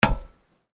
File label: Footsteps Iron plate One